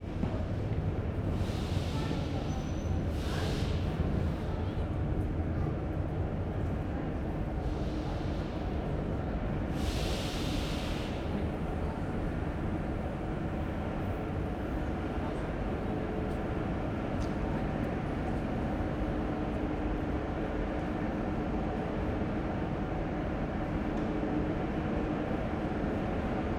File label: Moscow metro, platform, trains arrive and depart, ORTF stereo; Rechnoi Vokzal station; Moscow Ambience; Alexander Kopeikin